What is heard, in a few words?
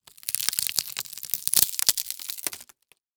Horror Wood Crackle